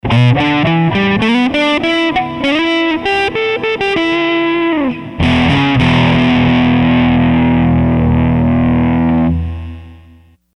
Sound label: Jungle; Sony HR-GP5